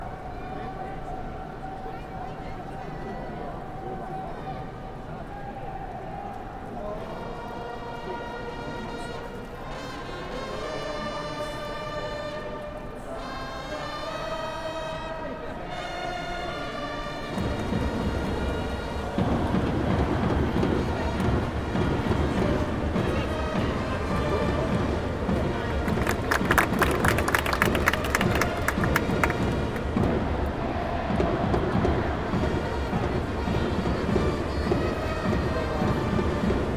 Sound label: baseball stadium